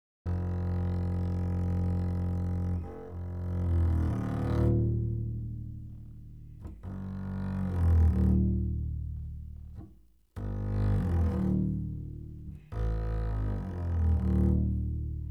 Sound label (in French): Violoncelle, Electroacoustique; Musique - Triangle Electroacoustique